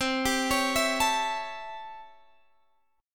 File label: C 6th Flat 9th C; arpeggiated